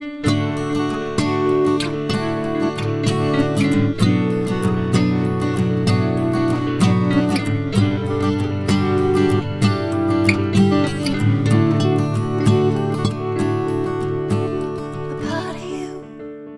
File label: backing